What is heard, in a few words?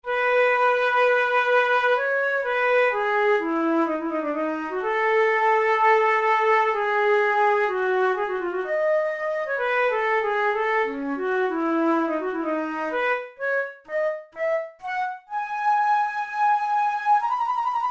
studies for the boehm flute; drouet 72